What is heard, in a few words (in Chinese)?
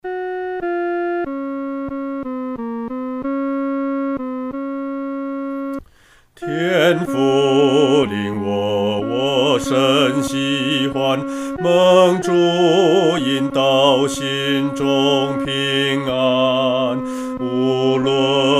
独唱 第二声; 天父领我